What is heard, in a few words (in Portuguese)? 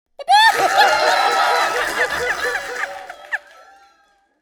Gargalhada Descalibrada, Risadas Individuais